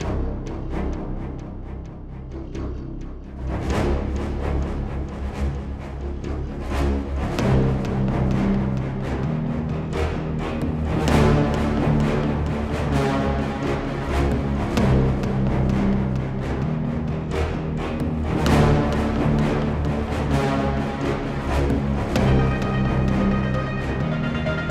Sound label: boss Battle; XiuXianGame